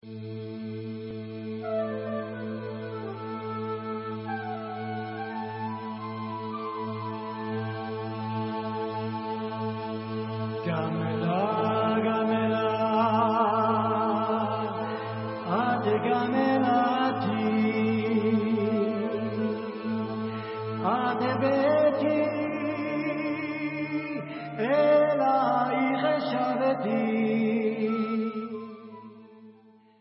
Choir

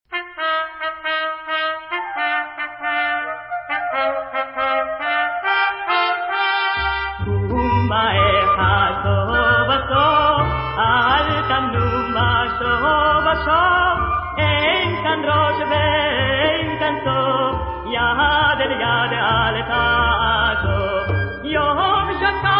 Vocal

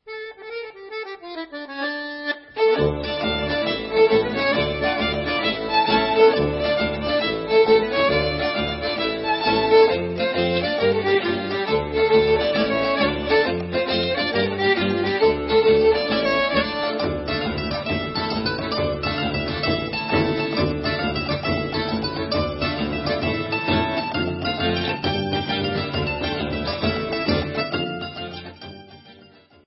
Dutch; Amarilletje

